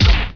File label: IMPACT A; Dkedemo